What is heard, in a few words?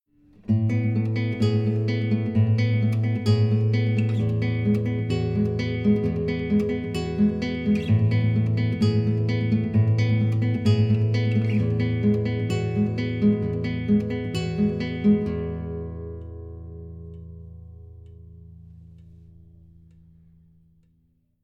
Right Hand, guitar